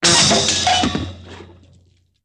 Bonk Metallic Hits; impacts

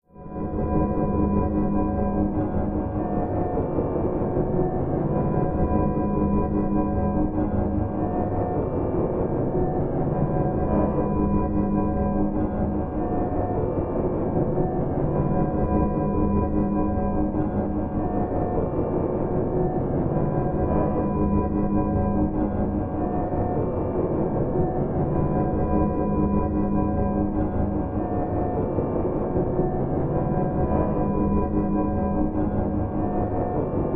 Low Bell Tones W Deep

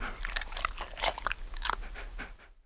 Dog Licks Pants

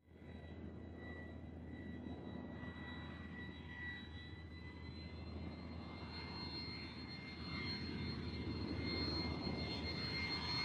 Jumbo Jet Fly Over, jets

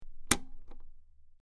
Button Large Button P, switch clicks

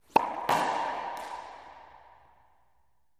Racquetball Bounces

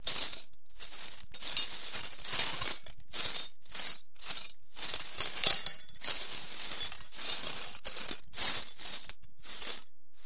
Sweep Floor Glass Pi

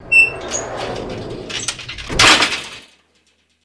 Slide Gate

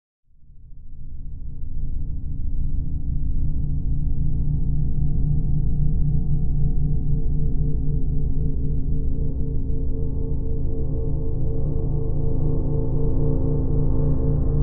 Space Ship Pass By; spaceship fx

